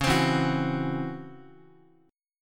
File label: Db Minor Major 11th Db Guitar; DbmM11 strummed; JGuitar